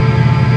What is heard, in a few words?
Maint Pulse